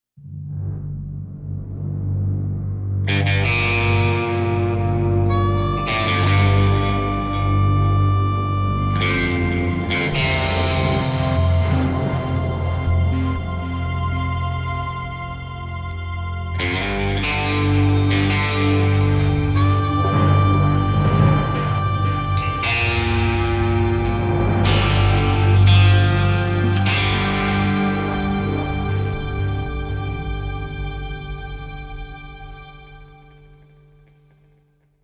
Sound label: Scientist Appears Music; Plants vs. Zombies Battle for Neighborville